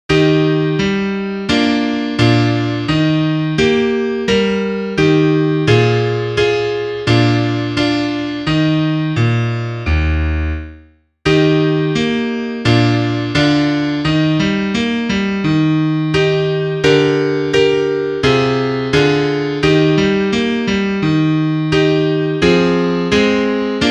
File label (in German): Klavier; schlaf kindlein